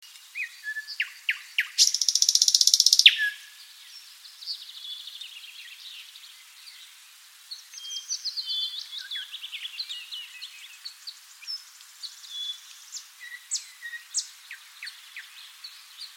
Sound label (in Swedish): Näktergal